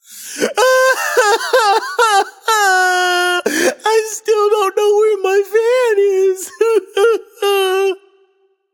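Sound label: nonverbal boohoo; smite; voicepack; merlin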